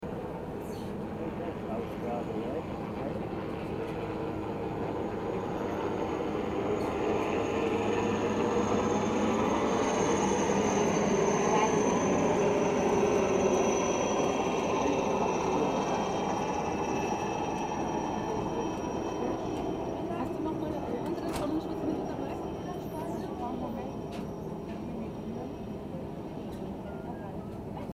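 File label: helicopter over a lake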